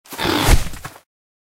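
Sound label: lizard attack Up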